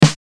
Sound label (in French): tambour; code game jam drop plafond 2025